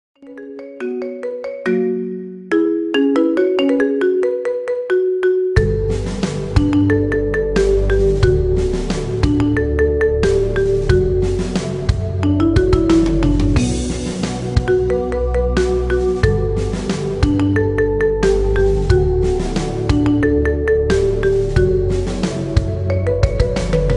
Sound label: marimba remix ringtone